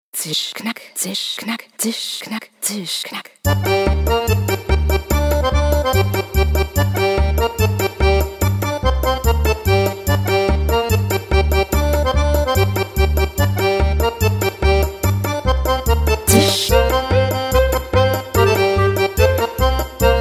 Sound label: instrumental; am lagerfeuer